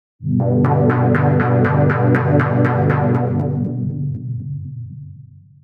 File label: Epic Pulse Pad, jup8